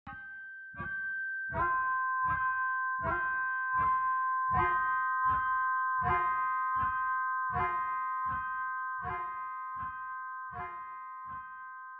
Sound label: ambient